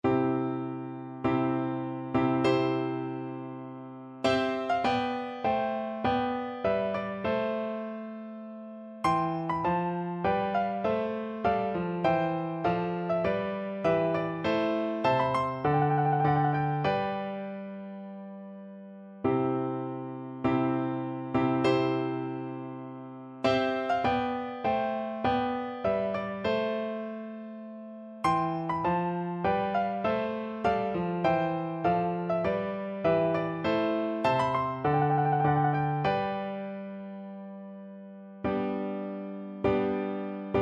March D, piano; Handel

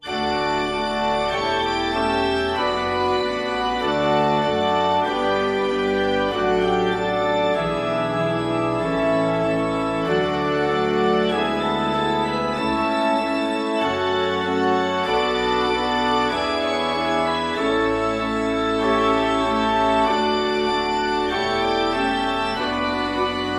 march, organ

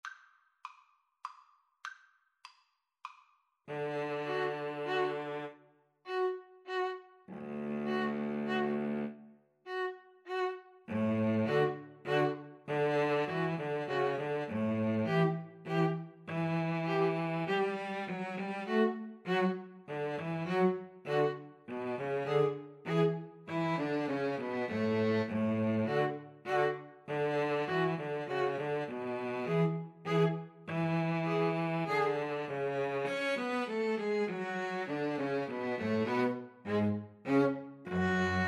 2 Violins Cello; saint saens elephant

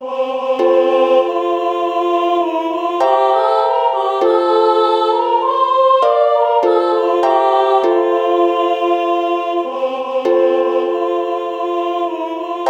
Uke, ukulele

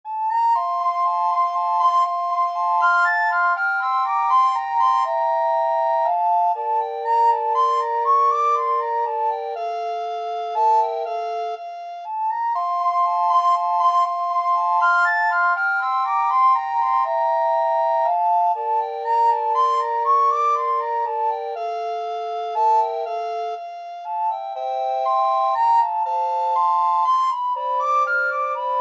haydn minuet hob ix 8, recorder trio

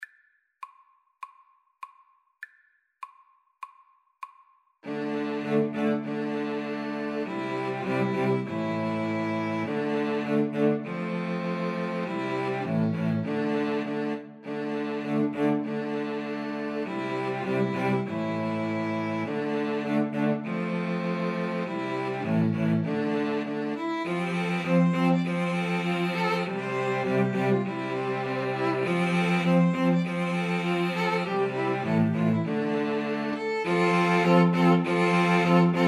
flute violin cello

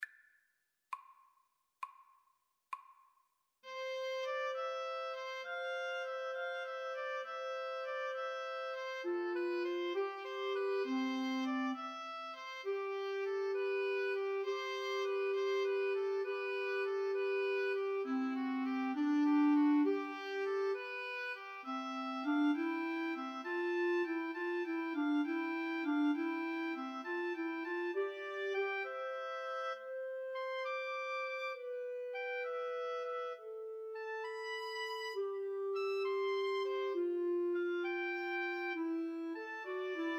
pastorale flute oboe clarinet

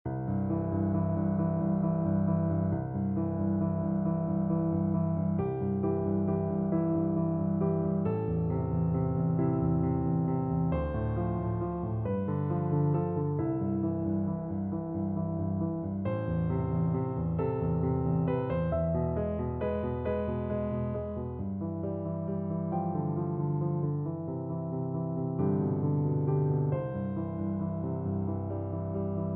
evensong, piano; lehmann

